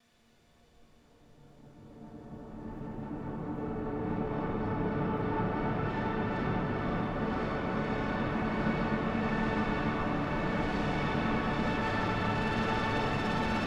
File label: Rain. Storm. Thunder.; Far: Lone Sails